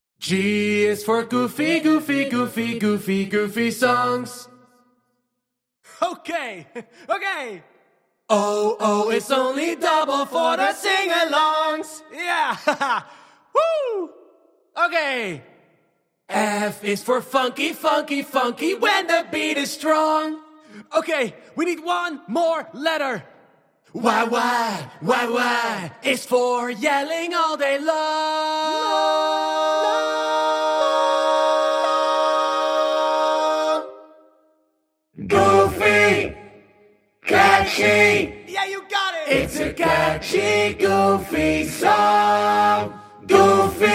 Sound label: goofy song acapella